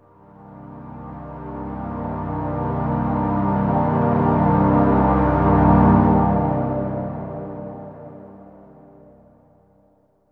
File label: synth pad ambient 160 C; Sphere